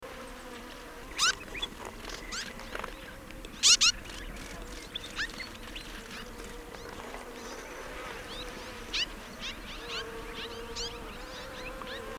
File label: Orange chat